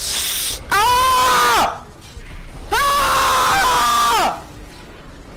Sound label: aaaaaa; tyler1